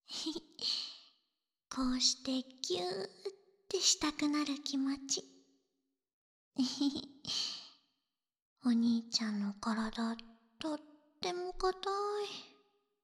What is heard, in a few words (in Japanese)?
耳舐め＆フェラチオ; ふふっ、わたしのキス、気持ちいい?